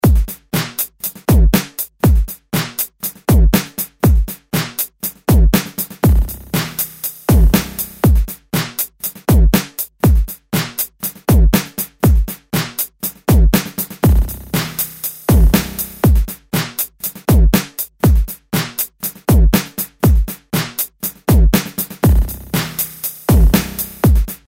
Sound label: Beat